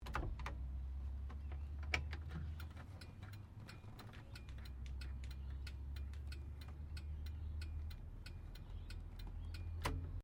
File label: CAR HOLDEN FE INDICATOR, Classic Australian cars